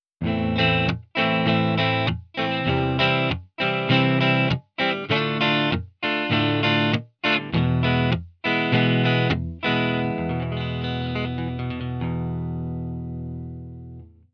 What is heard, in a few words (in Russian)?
boya chetverka s glusheniem